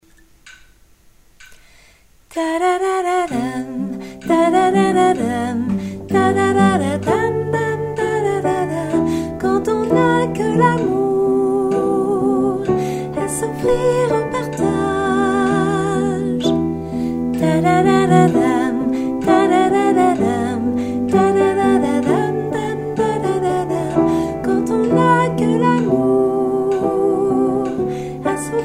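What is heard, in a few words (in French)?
soprano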